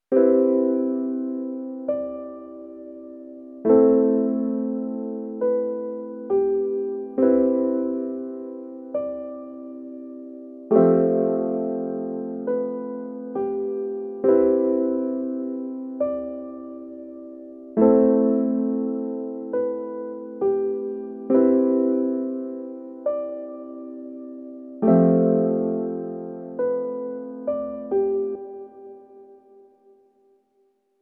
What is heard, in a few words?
piano